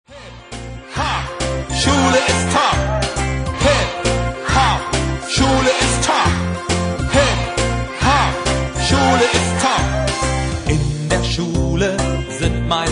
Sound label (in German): hip hop schule ist top